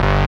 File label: SYN SMURF, Dance Synth; Sound & Vision - Gigapack I CD 2 (Roland)